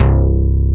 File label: MOGY BASS